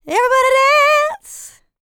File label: licks, vocal, dance; ILIO; Vocal Planet